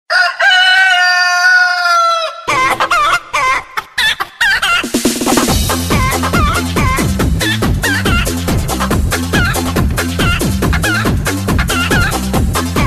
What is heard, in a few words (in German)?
verrucktes huhn